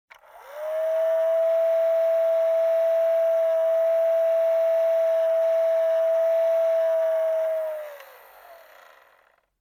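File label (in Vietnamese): Tiếng đang làm khô tóc bằng máy sấy tóc nhỏ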